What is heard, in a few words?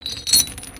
chain drag; creatures organic